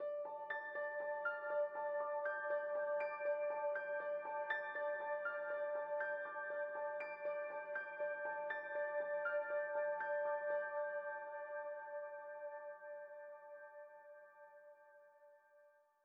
Citadel Piano; Frozen Flame; windows

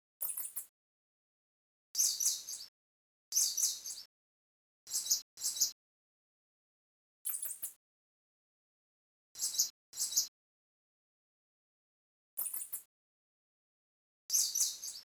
Ambient Birds; mother 3

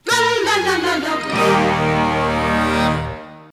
Goal Fanfare; crash bandicoot series sound box; Dead Heat; 3rd Place

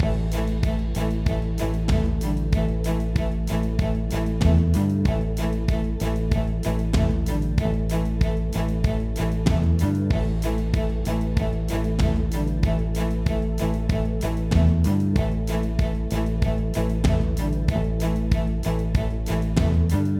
Evolution Buildup; Nexomon: Extinction

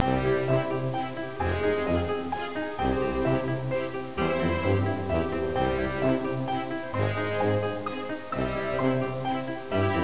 Orchestrated Waltz; Spy Fox in Dry Cereal

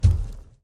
Giant Stomp; Lethal Company

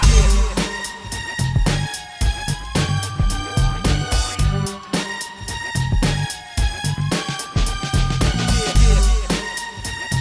Police End Short Loop; True Crime New York City; Xbox; Shared Da Police End Short Loop

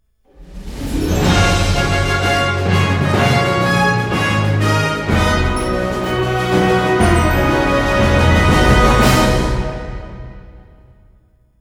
Level Up BGM; lime odyssey soundtrack windows gamerip 2012

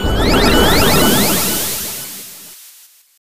Distortion Sound; The Simpsons Bart's Nightmare; SNES

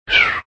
Snore; Mario; Super Mario 63

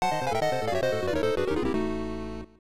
Lose a Life; Super James Pond 2; SNES

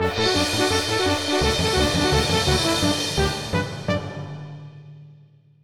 Evolution Fanfare

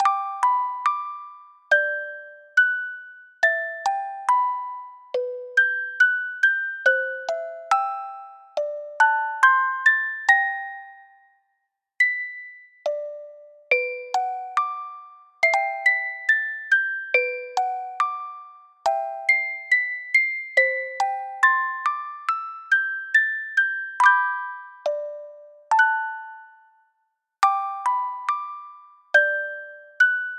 Aria (Music Box); animal crossing new leaf welcome aamiibo soundtrack

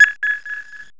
mission vaccine

